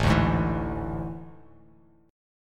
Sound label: A Major 7th Suspended 4th, Bass Standard; AM7sus4; strummed; JGuitar